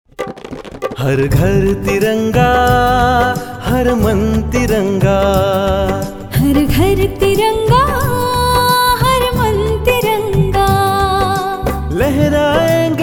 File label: Har Ghar Tiranga Hindi Jingle